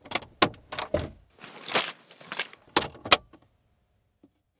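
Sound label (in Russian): металлический резкий звон ключей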